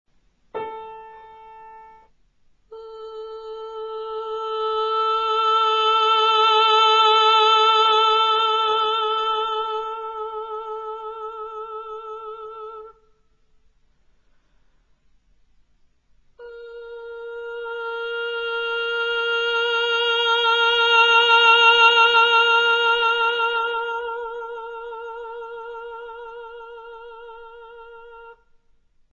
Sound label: vocals